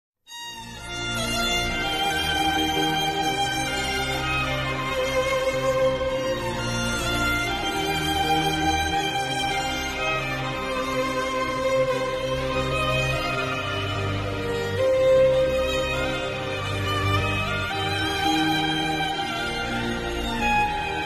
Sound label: Heart Touching Ringtone; Raja Rani